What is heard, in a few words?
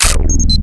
deact, visor; zaero; items